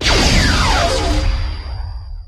phaser; svencoop; borg cube; gauss2

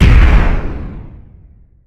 final hit; sven; russian sounds; controller

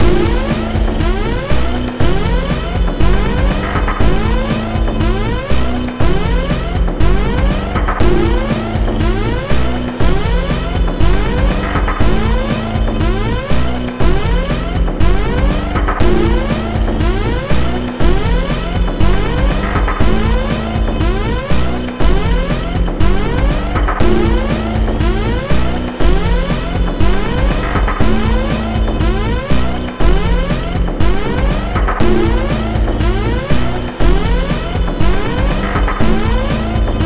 main loop

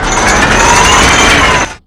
m134 spinup; m134 hero

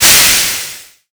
steam; papin

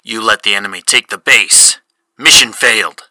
fail

